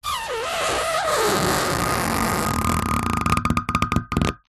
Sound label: rubber tape stretched across metal squeak rub; zapsplat